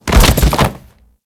Grineer Light Fall, Body Falls; Warframe

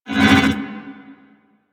Void Portal Rotate One Off; Warframe; Ambience; Orokin; Oro Sabotage Objective

